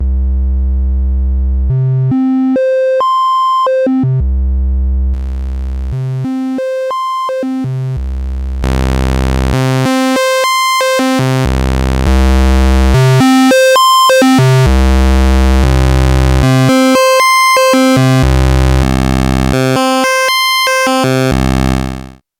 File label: basic waveforms, Creamware minimax